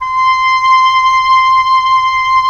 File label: WND OB P, Oboe p; Roland - LA Composer vol. 4